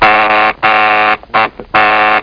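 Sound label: door buzzer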